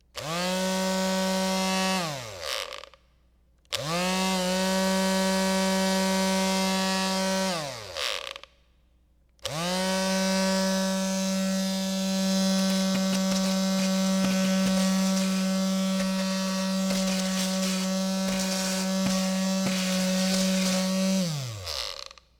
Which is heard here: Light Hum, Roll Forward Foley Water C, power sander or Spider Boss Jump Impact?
power sander